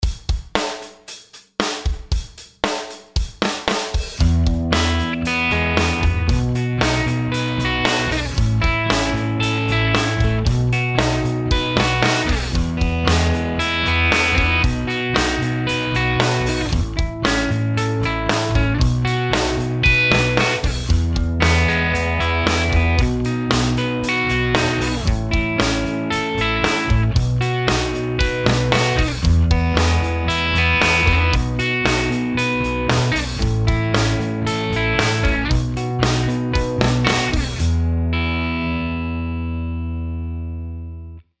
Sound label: chord progs maj root; harmony